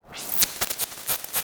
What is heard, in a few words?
Glitch